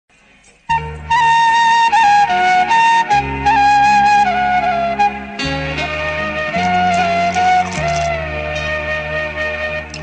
telugu sentimental theme ringtone; venkatesh soundarya raja telugu movie

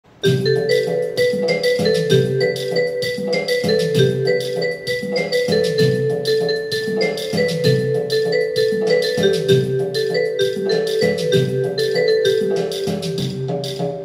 dandiya instrumental ringtone navratri special

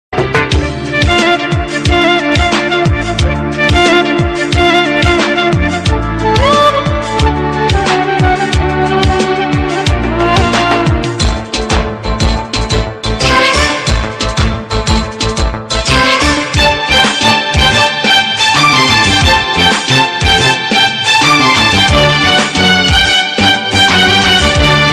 bgm

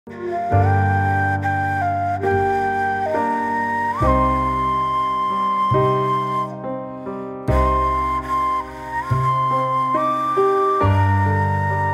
flute cover ringtone

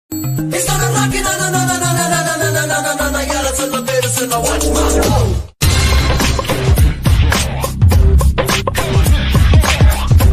anniyan remo song bgm ringtone

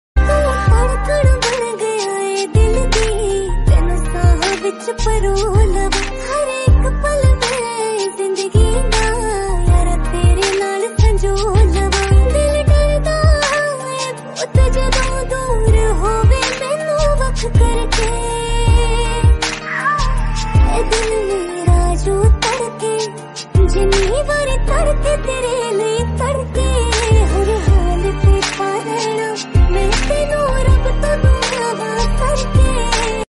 punjabi ringtone

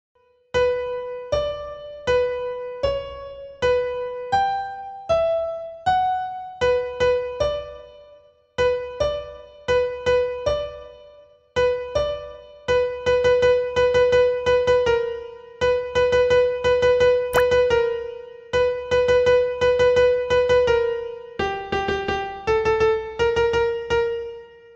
BGM PIANO

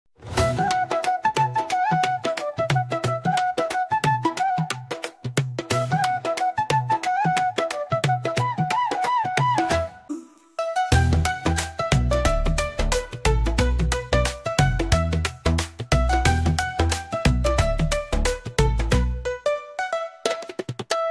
flute bgm ringtone, flute background music

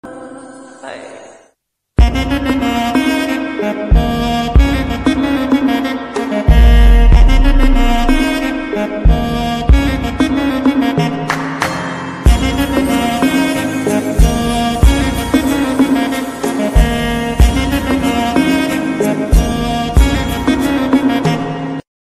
slowed version ringtone instrumental

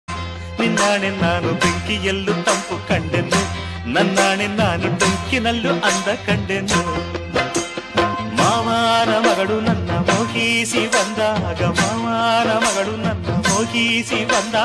kannada love songs; kannada films

